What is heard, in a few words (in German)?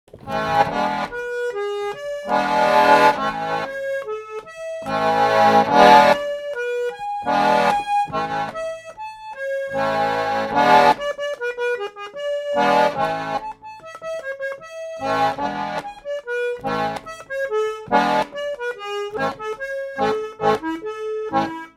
walzer